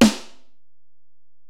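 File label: Acoustic Snare, Alesis DM-PRO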